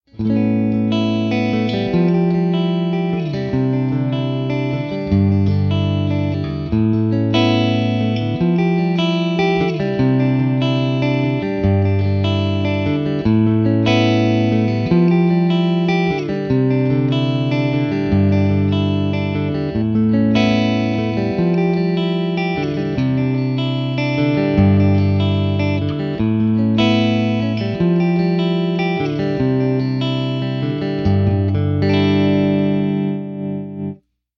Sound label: tele amb, amps